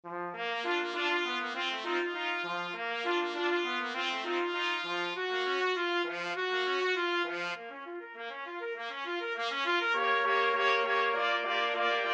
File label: rag, trumpet quartet